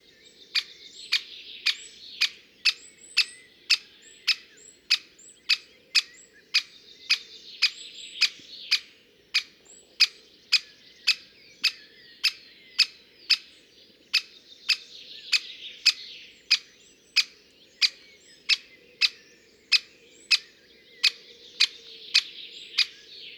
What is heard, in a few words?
Great Spotted Woodpecker